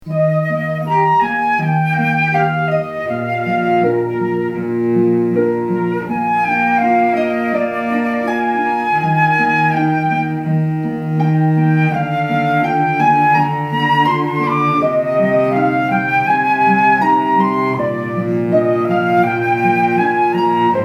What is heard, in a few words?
Flute, Cello, Harp; Largo from Winter